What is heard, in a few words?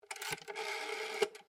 TELEPHONE OLD ROTARY BAKELITE DIAL; Bakelight phone; Telephone Sound Effects; Electronics